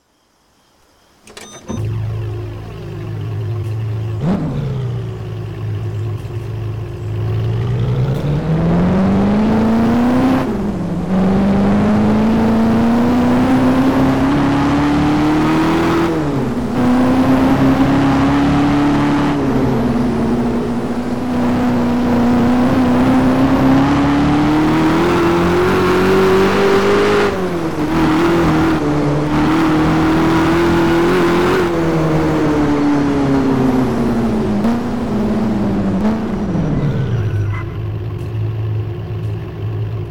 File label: ferrari 488; Ferrari 488 GTB; TDU-1